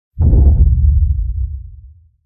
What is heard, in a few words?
Mega Foot Wump Hit Deep; Ninja Tracks